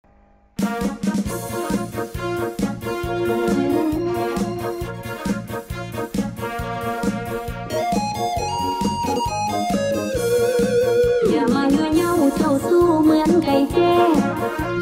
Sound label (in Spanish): TAILANDIA MUSICA